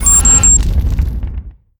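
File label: tower attack ready charge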